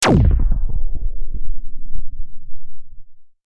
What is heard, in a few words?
Futuristic Gun Sound; Bullet and Gun; FXHome